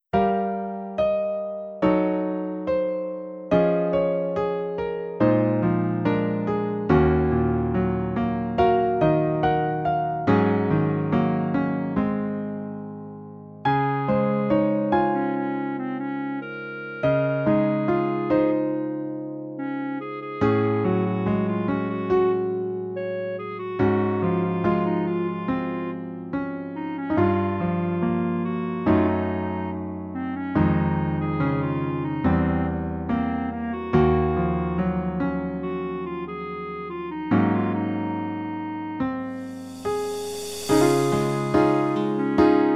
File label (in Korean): Piano, 멜로디MR -1키